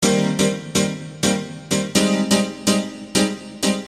Piano Loop

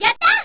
Yah; Yah from SF2, when she does her lighting leg.; Street Fighter; chunli

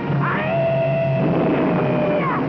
Ieee

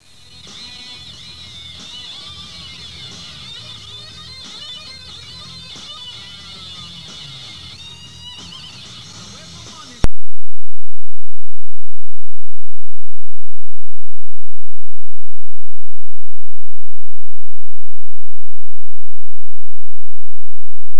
gibby riffs

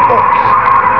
orcs

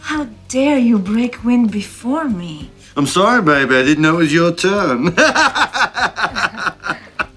break wind

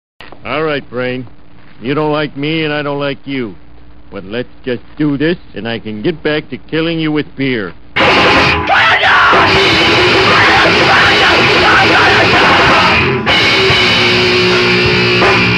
Happycore

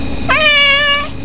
meow

